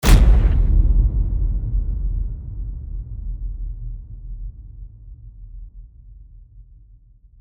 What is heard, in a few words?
Epic Low Hit